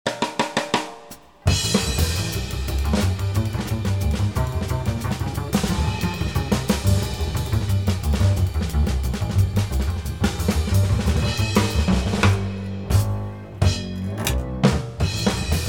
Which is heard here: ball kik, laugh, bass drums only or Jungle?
bass drums only